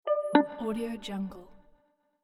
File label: notification lux style